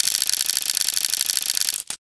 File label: clip spin long; video games; player; taunt; source engine; tf